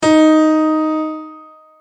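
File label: D sharp 3 piano; notes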